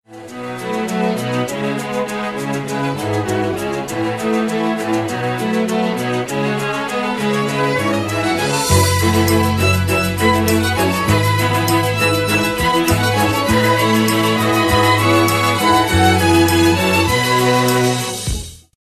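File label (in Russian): скрипка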